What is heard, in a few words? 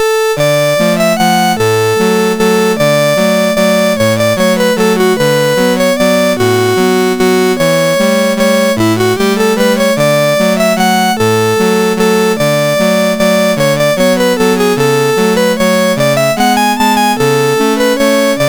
Waltz, CSG8580R5; Eugene Onegin